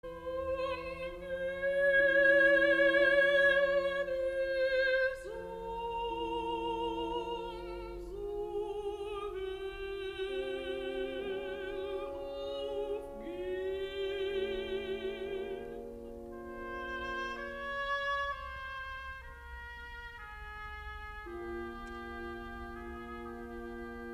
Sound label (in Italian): canto